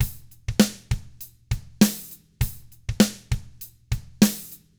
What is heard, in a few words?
ROCK, Drums; ILIO; Double Platinum Drums 1